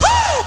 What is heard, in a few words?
"woo", male; ooh ahh; ho hoo woo